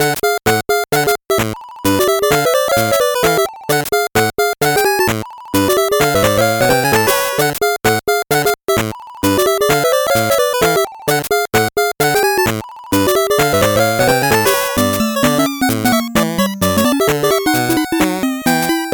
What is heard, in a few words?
8bit bgm normal